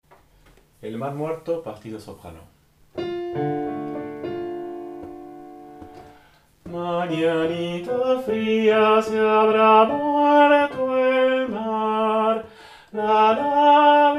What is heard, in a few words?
Soprano